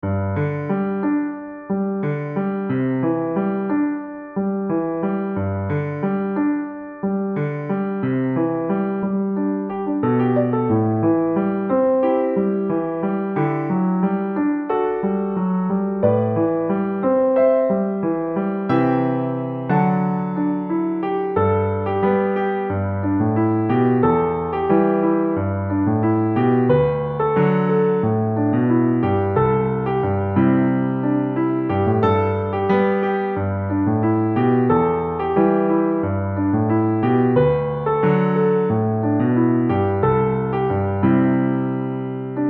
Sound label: adv, piano